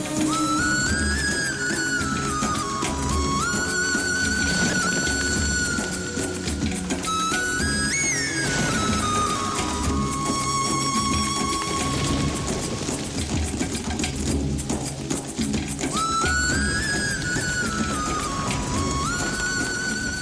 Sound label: instrumental ringtone